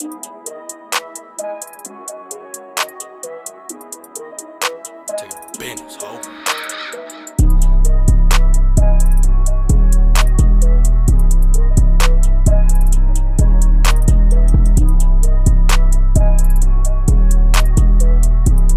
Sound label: Trap Beats